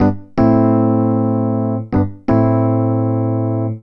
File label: DEEPHOUSE ORGAN 125 A